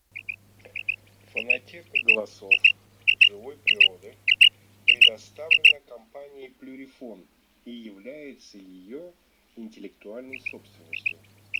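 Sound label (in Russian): Перепел Quaglia, chip mini для манка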